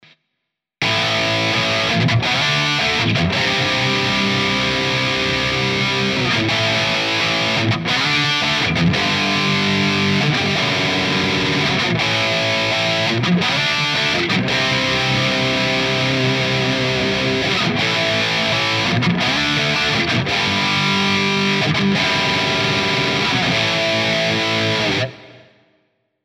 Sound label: Soldano High Gain Rhythm; irig Micro Amp; Amplitube4